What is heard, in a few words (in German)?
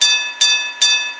D Glocke